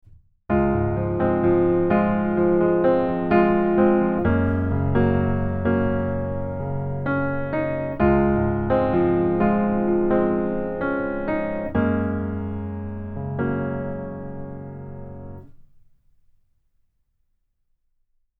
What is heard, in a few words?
Grand Piano; LA Custom C7